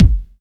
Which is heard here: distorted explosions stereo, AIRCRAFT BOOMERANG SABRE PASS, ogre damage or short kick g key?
short kick g key